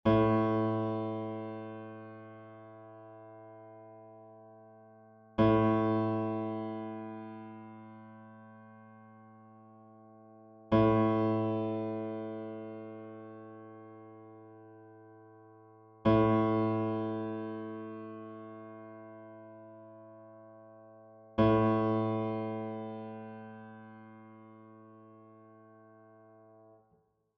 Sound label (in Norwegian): stemmetoner for gitar a strengen a2